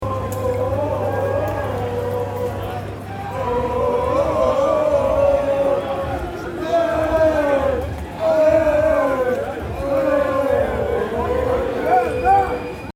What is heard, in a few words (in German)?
Gegroele